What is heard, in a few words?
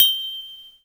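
Yamaha TG100 Tinkle Bell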